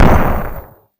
Small explosion; Hovl Studio; SFX Battle sounds; WhiteMan Unity2D

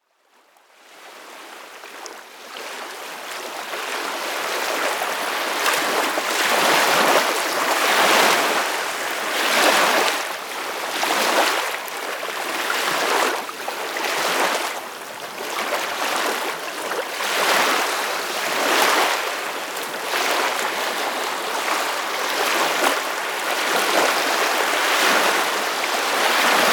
Sea Side Ocean Wave; Ambience; WhaleAdventure VR

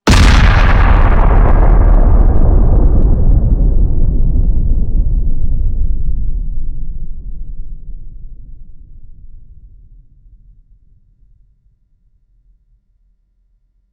Big nuclear explosion; Hovl Studio; SFX Battle sounds; WhiteMan Unity2D

